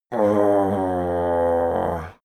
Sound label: male grumble annoyed; menschen; koerpergeraeusche; soundpool